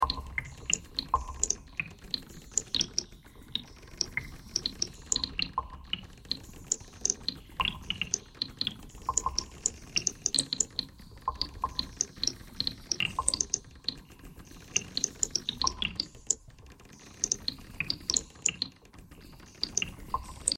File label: bagpipe thread pentatonic